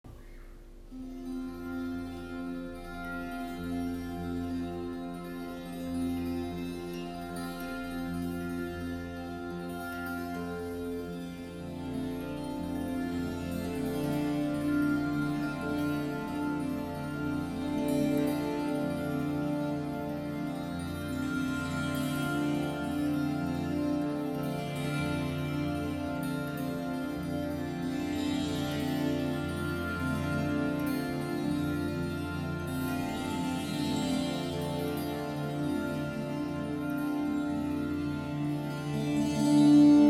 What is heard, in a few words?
Tamburina